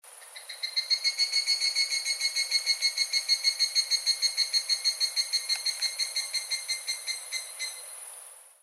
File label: higurashi